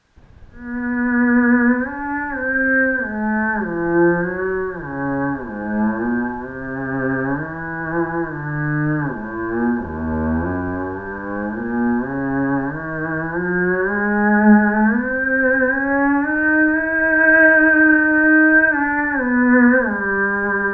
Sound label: ews resonator, theremin; acoustic resonator